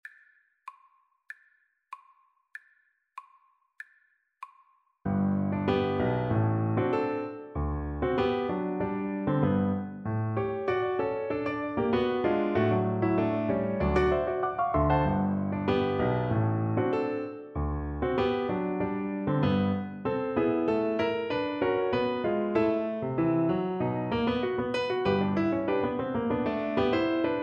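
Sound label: viola karaoke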